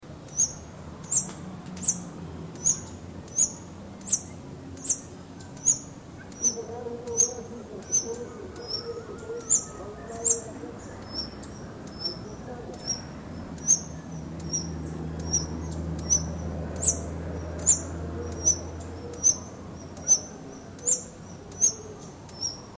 amethyst throated hummingbird

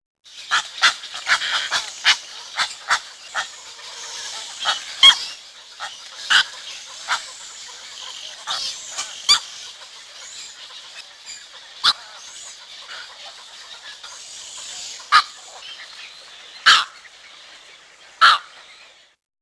yellow crowned night heron

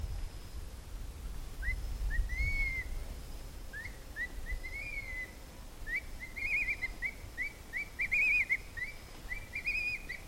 black hawk eagle

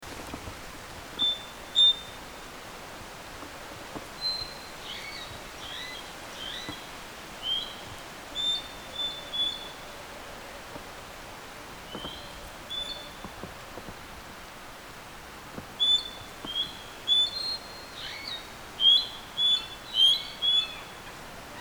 blossom headed parakeet

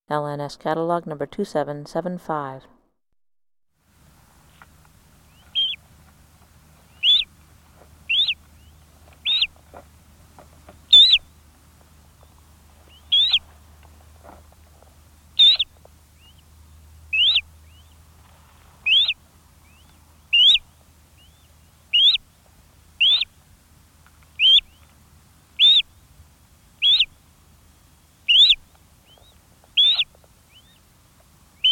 red crowned crane

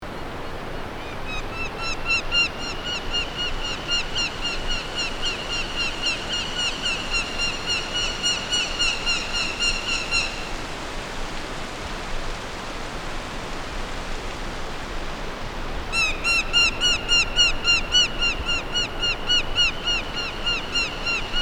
orange breasted falcon

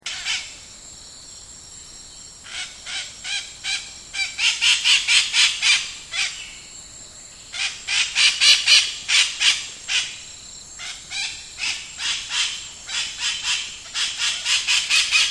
white eyed parakeet

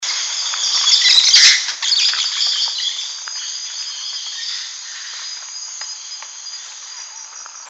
rose faced parrot

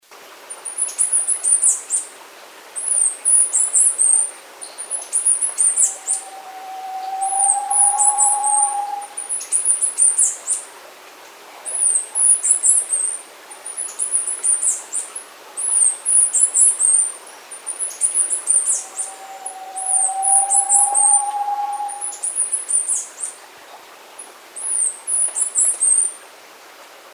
chestnut capped brush finch

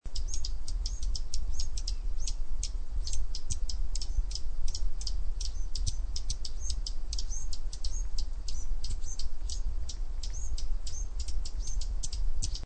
patagonian sierra finch

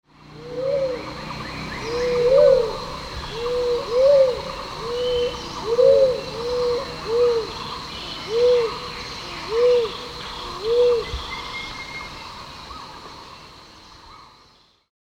barred cuckoo dove

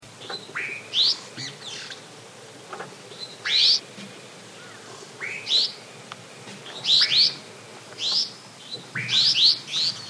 white winged swallow

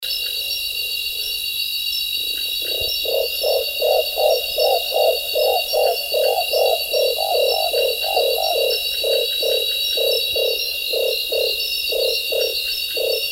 bismarck hawk owl

